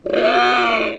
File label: die